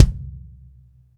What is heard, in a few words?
TD-20 BD, Roland TD-20, Bassdrums